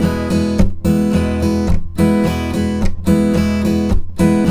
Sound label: Blues Guitar Samples, RIFF; ILIO; Fretworks